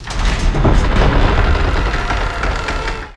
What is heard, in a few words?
Door Large Wood Open